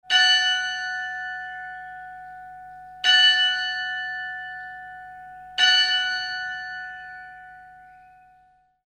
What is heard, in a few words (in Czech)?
zvon dar slp mzlu brno; zvonohra